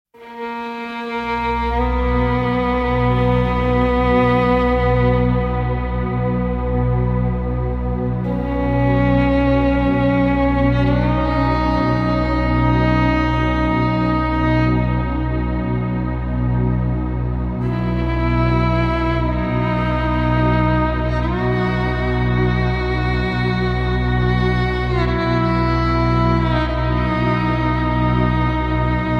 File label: Violin Sky Northern Lights Drone